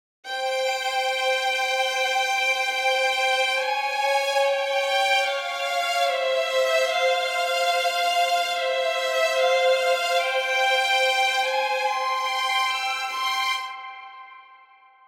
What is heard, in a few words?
strings, 145BPM